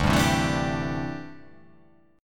D 9th Suspended 4th D down; strummed; JGuitar

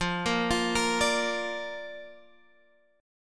F 6th Suspended 4th F, chord; F64 arpeggiated

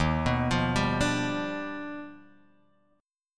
Eb Augmented Major 9th Eb down Guitar Drop D

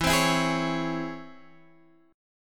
C sharp Minor Major 7th, chord

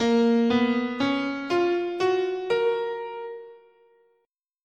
Bb Balinese Pelog, scale

